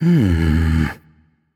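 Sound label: nonverbal thinking; voicepack; smite; merlin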